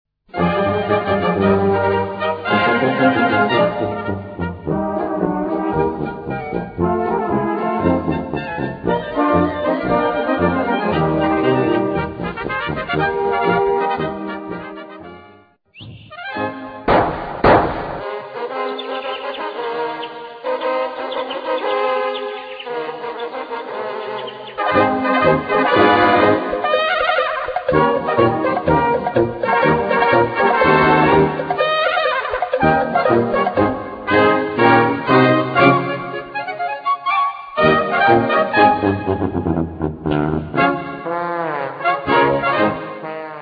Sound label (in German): Polka